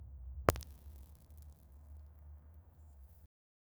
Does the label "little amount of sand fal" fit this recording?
Yes